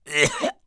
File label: cough; Heretic II Demo